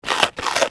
eat; yoyo

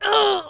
die male

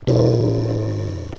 evil druid die

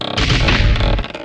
dragon attack

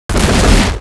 attack; MD CAPTAIN

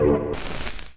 deadsoul die

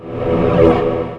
flame skull move

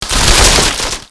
stone shooter die

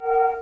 move; JACKONETTE

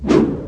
attack axe

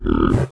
toad attack; ash toad

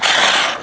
chimera damage

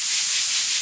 pyramid sandfall

